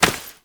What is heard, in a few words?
Step Dirt, Foley, Footsteps; Enemies; Warframe; Duviri; Dax